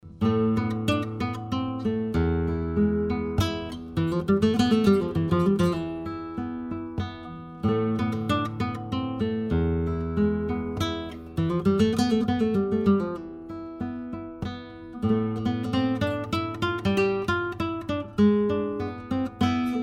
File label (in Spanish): soledad guit